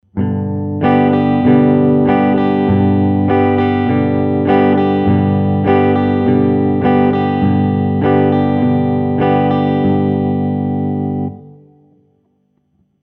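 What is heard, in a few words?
Basic Right Hand Strum Pattern, guitar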